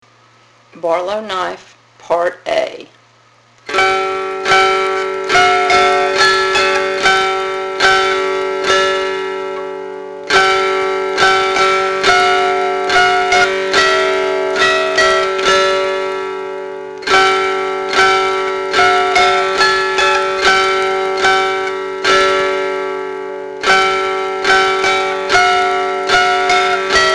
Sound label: slow, dulcimers